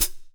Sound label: HAT CL; Q Up Arts; Denny Jaeger Private Collection